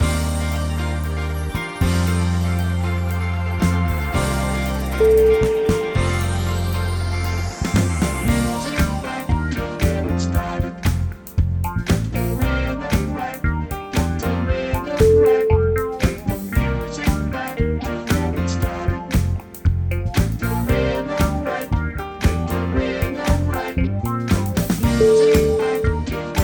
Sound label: getting funky